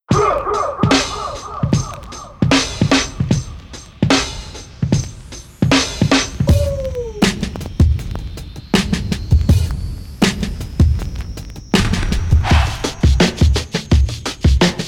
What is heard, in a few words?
Golden Breaks; Black Octopus Sound; 1K Golden Breaks; Neighborhood Production